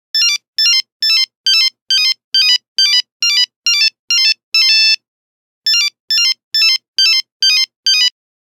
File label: 3310 Hopping down, Nokia Buzzer; Windows Phone apps